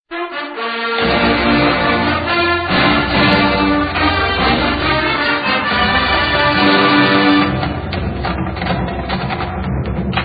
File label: Dixie Fanfare